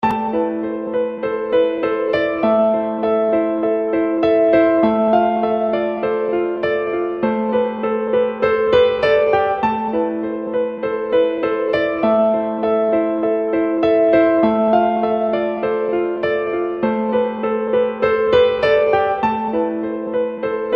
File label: Nice Piano